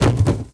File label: bodyfall metal